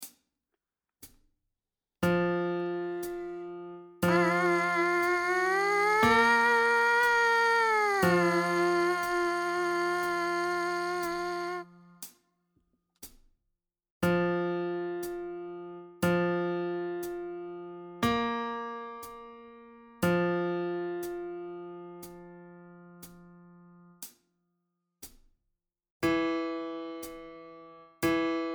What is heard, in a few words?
Mpc buzz line